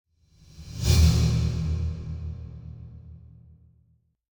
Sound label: Drum Metallic Hit - Final Hits Dangerous - C; Hollywood Edge; sound designer's toolkit v2